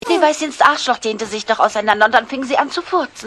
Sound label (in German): furz; schneeweisschen